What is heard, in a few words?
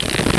Cards; Recycle!